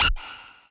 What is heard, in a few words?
lead